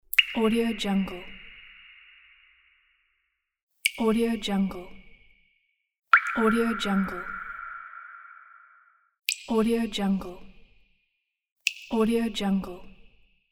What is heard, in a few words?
horror water drop; audio-therapy